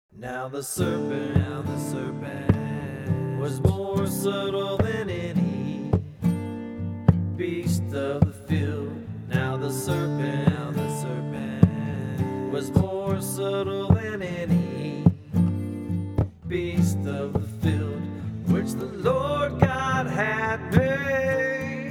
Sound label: Acoustic